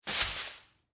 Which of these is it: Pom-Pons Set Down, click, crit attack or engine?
Pom-Pons Set Down